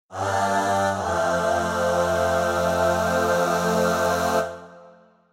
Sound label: bright aahhness